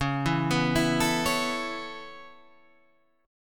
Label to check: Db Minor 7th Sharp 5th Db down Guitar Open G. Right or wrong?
Right